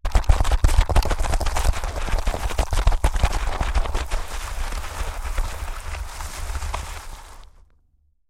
machine grind

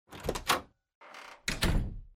mine door

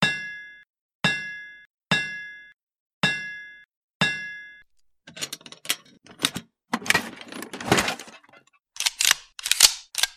hammer

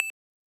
push end, radio

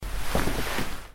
sit

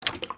dispense

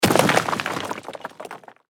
ore fall

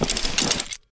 loot

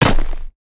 pickaxe hit

